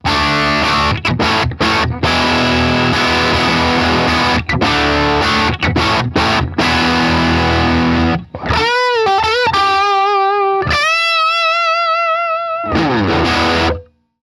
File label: Reverend Bridge Dirty